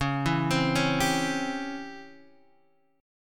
C sharp Minor Major 7th Flat 5th C sharp Guitar Standard, chord; arpeggiated; x,4,2,5,2,3